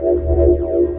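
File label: Ambience Sci-fi Energy Loop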